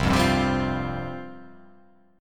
C Suspended 4th C

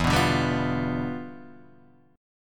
E Minor 7th Flat 9th E

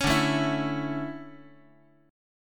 D Major 7th Suspended 2nd, Guitar; strummed; JGuitar

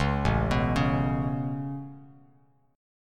A Major Add 9th A Bass Standard, chord; Aadd9 arpeggiated; JGuitar

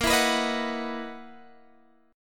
Gb 13th Bb, guitar

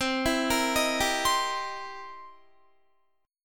C 7th Sharp 9th C Guitar; arpeggiated; JGuitar

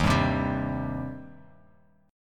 Eb 6th Eb down; Eb6 strummed; JGuitar

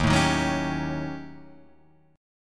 D sharp 7th Sharp 9th Flat 5th

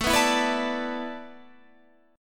A Suspended 2nd Suspended 4th, Guitar, Standard; Asus2sus4 strummed

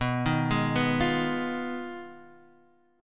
F Minor 7th Flat 5th B

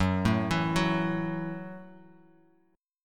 Gb Minor 7th; arpeggiated; JGuitar

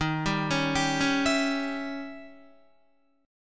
Eb 7th Flat 9th, Eb, Guitar; Eb7b9 arpeggiated; JGuitar

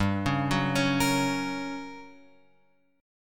G Minor Major 7th Flat 5th, G; GmM7b5 Chord {3 4 4 6 X 6} arpeggiated

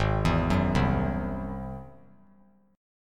G sharp Augmented 7th G sharp, down; arpeggiated; 3C,3F,3A,3D; JGuitar

